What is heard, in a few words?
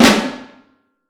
Vintage Snare Drum